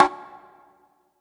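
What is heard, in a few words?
BONGO, drum machine; Drum Mashines; EdgeSounds; JD DRUMS KIT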